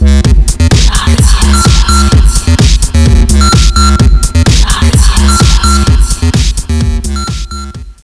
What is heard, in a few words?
BASSSSSS